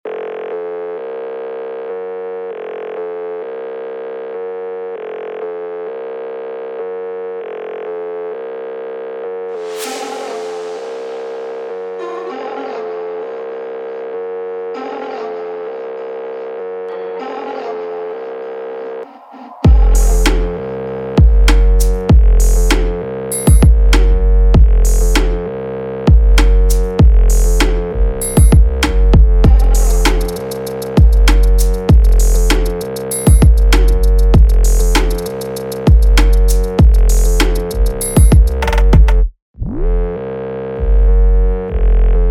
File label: Instrumental